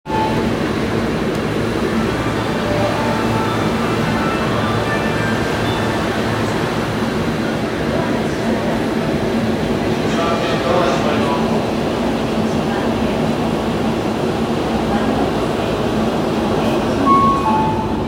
千代田線新御茶ノ水西

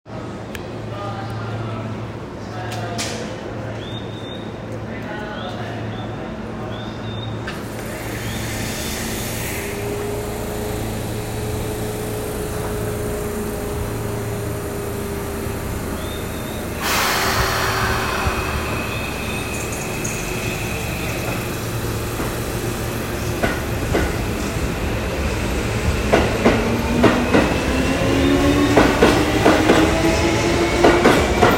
線明大前東接近, train sounds; 京王

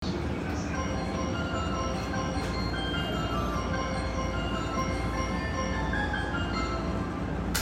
中央・総武線幕張西, train sounds

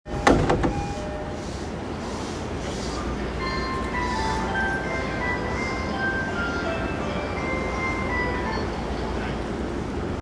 JR東海道線鴨宮南, train sounds

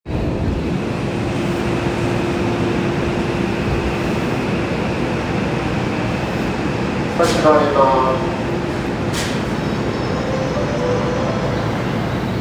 池袋線西所沢東, train sounds; 西武

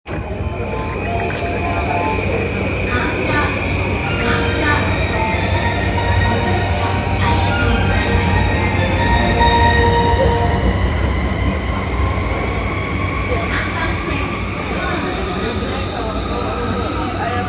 JR 山手線 神田 CCW, train sounds

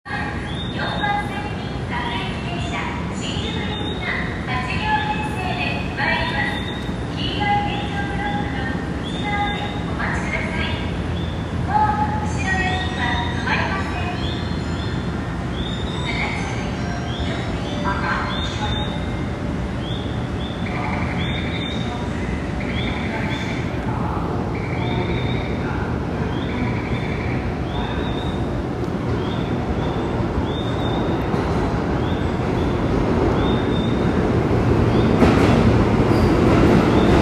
京王線、京王動物園線高幡不動東西接近

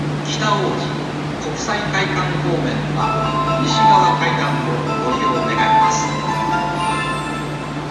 京都市営地下鉄東西線烏丸御池東

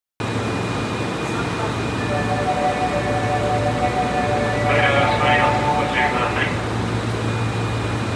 大阪メトロ千日前線鶴橋西, train sounds

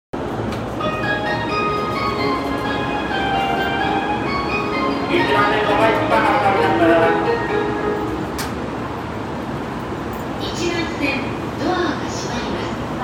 京葉線新木場東, train sounds

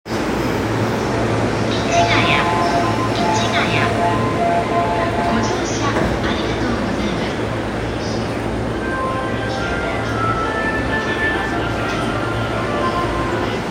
JR中央・総武線市ヶ谷西, train sounds

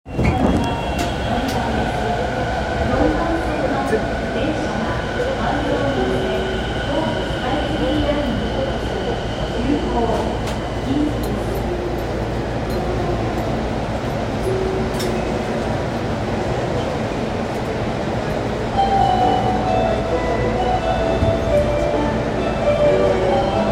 半蔵門線九段下東

